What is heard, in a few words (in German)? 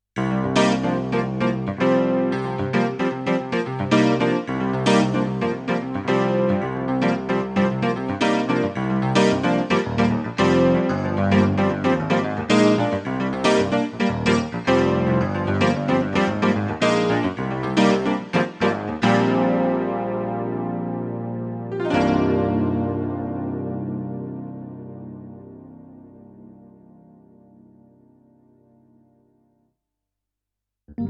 Dexibell Vivo SX-7 test rockpiano